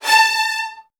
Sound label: A3, VN 16TH, Virtuoso Strings; ILIO; Kirk Hunter